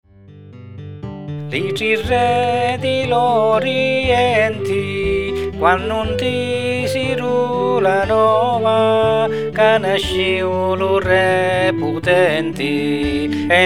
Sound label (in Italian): vocestrum, canzoni religiose; Li tri re